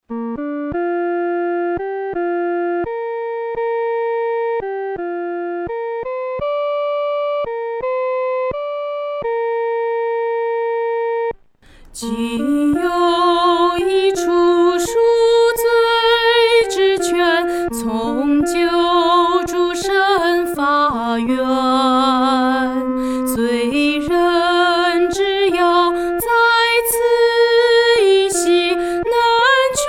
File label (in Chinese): 独唱（第一声）